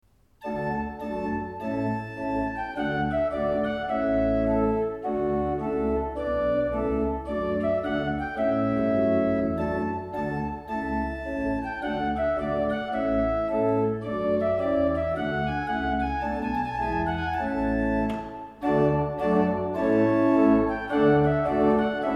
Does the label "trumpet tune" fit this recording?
Yes